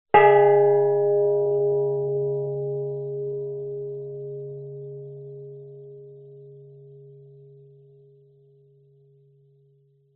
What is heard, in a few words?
bell large gong like single hit